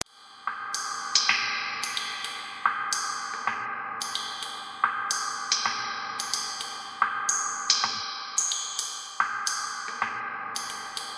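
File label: rhythm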